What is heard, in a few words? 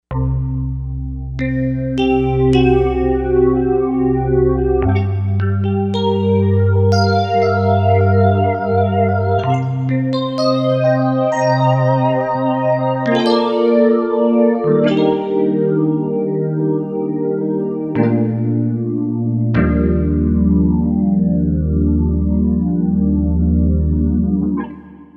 Wavesweep, Korg M1Rex; Factory Patches